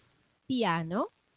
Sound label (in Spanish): piano